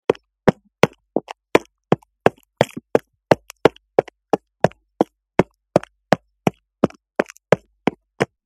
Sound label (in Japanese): スニーカーでコンクリートの上を歩く音; sneaker